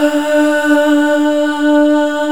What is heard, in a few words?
Breath; Vox & Voice